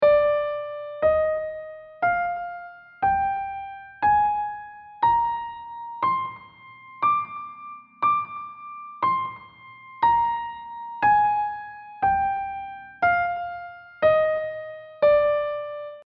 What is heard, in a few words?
Locrian; ear-training